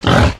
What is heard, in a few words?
boar pain; monsters